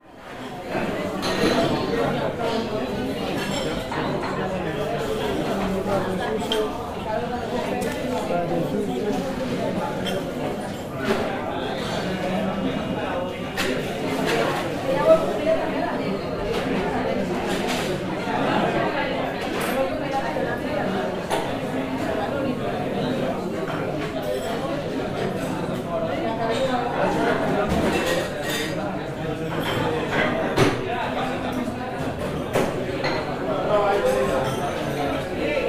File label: Ambient Bar; Daperitius